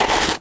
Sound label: Sand footstep; starbites